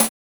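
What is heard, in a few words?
mod snare, Synths and FX, Mod Drums; neuro; Sinistarr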